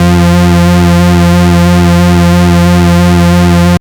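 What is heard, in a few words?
bass sustain beat F; Polyend; ARC Noise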